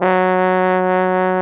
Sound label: BARITON; C4; Best Service; Alpine Volksmusik